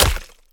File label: splat